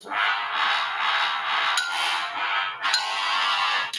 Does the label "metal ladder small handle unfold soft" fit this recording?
No